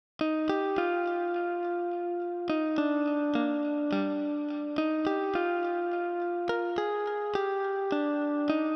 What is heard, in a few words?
D#maj 105BPM Pluck, Music Loops; 99Sounds; Monosounds; PANDORA BOX!; Miami Beach